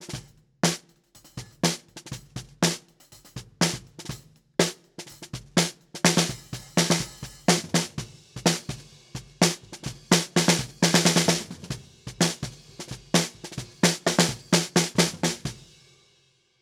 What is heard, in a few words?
amb pa snr bottom sm57